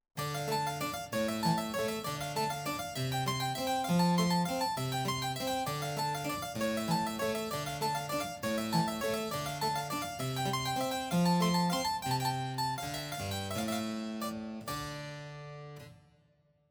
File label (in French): Folies d'Espagne Clavecin; SachaDV